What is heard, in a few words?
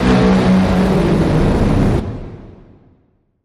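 Creature Beastly Roar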